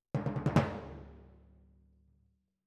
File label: Timp Close, RNR for piano